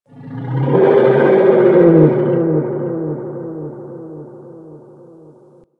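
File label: Ocean Various Creatures; Warframe; Grineer Ocean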